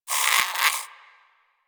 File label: Rodent Vocal Snore; Warframe; Hunting Critters